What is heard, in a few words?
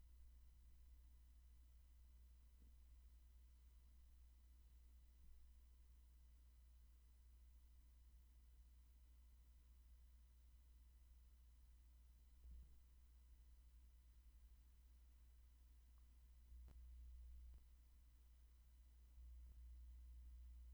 roomtone